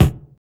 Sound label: BD, Hip Hop R n B; USB Soundscan vol.19; AKAI